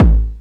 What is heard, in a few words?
BD, Dance Mega Drums; Best Service